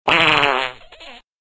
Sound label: fart not much time